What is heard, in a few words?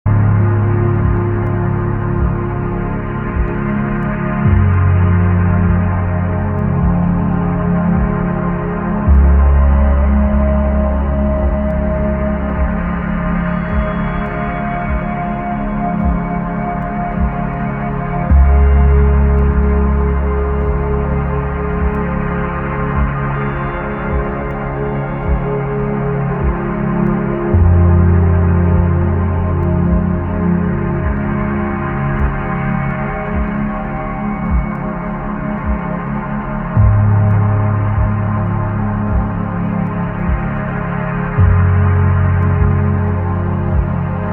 pop ambient